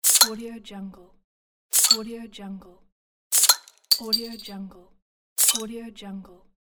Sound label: open coke bottle; gamechestaudio